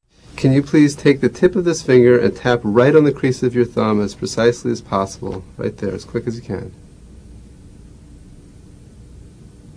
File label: fine finger tap